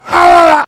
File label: heavy pain severe